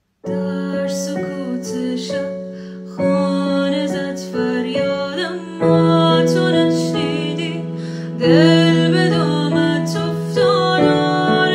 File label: Guitar